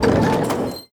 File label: panel floor